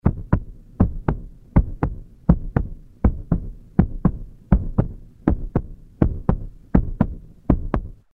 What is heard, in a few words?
loosing blood, heart beat